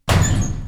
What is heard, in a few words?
Locker